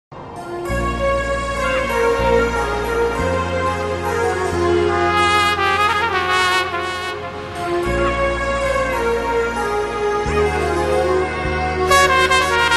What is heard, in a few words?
Trumpet